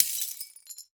Crashes Glass Debris Impact

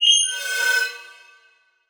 Transition Bell Hit; Production Element Title Transition

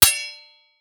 Weapon Sword Hits Sword; AdobeCC

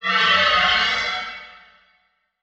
Title Transition Swell

